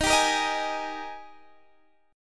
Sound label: G sharp Major 7th Suspended 2nd